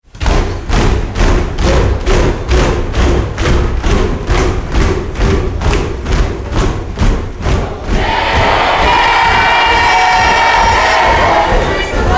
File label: Cheer